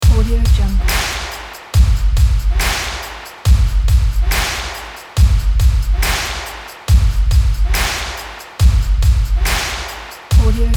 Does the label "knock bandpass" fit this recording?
No